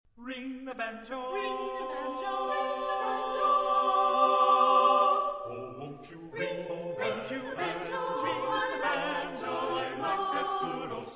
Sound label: Ring The Banjo